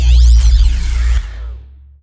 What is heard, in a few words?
sci-fi electric pulse hum